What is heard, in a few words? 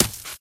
gravel footsteps, infected walk